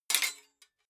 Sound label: sword sabre metal block